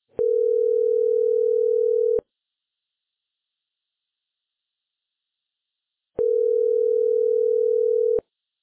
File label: Tone Early Media US